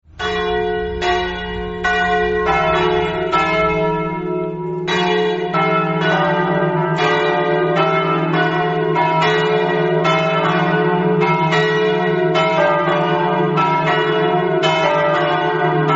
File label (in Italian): pradamano, campane